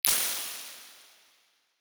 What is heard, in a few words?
simulated impulse responses; amt